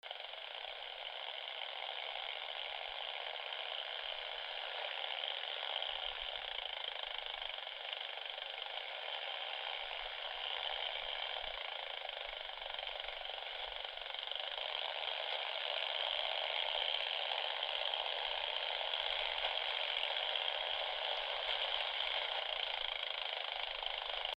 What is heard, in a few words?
Conocephalus dorsalis, Thermenlinie